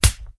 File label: Slap